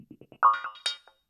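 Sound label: PERCUSSION ELECTRO 140, Techno Drum; Techno2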